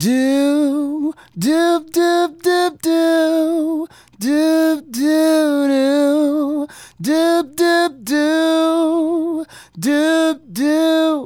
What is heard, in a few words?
Male Solo, Hip-Hop and RnB Vocals